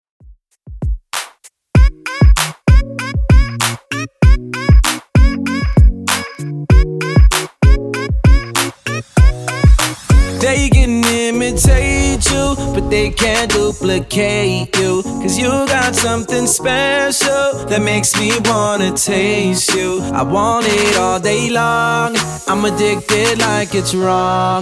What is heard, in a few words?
2ks Redrum 97bpm